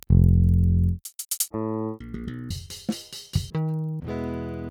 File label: Pultec; Virtual Analog